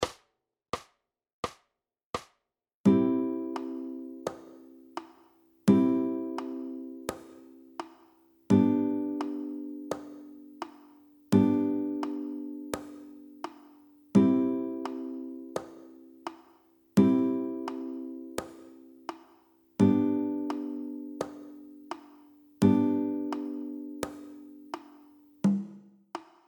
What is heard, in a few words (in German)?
Highchords Akkordverbindungen Saite 1 3; gitarre